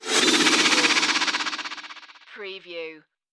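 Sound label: Production Element Chopper; Sound Effects Bible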